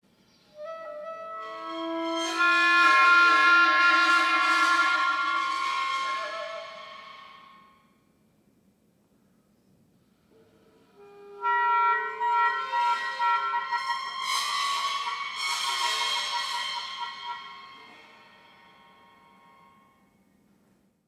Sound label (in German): Fluss Saebelzahntiger, Sabre-Tooth Tiger